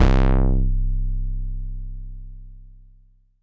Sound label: Sawtooth c2, Korg Poly-800